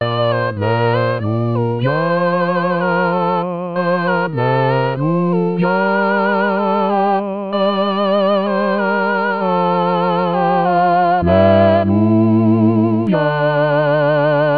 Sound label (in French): Basse